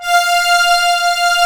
ELG STR F5, ELE STR

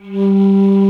ALTO FLUTE G3, WOODWINDS; Greytsounds VOL-1

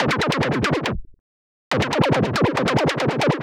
140BPM C; Vengeance - Essential Clubsounds Vol.4

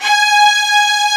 STRINGS G#5; ORCHSTR; AKAI S-Series CD-ROM Sound Library VOL-2

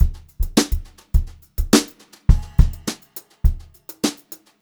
Brushed Drum Loops; USB Soundscan vol.39; AKAI

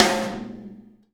Snare Shard; Sonic Foundry (Sony Creative Software) - Processed Drumkits Zero Gravity Beats; Shards

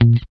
GUI A2#, E-Gui Muted; Sound & Vision; Gigapack I CD 2

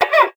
FRET, FINGER MUTE; Xsample VOL-1

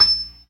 PERC, ORGAN; Keith Emerson Signature Series

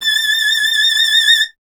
STR VLN, Vln Detache; Miroslav Vitous

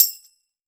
JINGLE ST, JINGLE STICK; Voice Crystal - Steve Reid Definitive Percussion

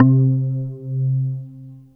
WOOD FX HI; Back In Time Records; Big Bang; SFX

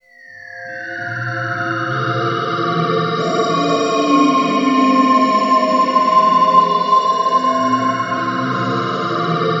C5, SYNTH; PLUTO; Q Up Arts; Dream Experience

